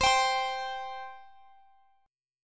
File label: C 5th C; strummed; x,x,x,17,20,20